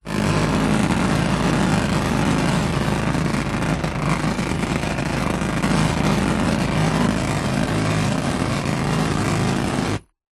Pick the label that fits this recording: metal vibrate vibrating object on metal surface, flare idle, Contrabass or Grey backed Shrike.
metal vibrate vibrating object on metal surface